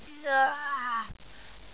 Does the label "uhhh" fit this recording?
Yes